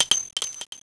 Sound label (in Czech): Cink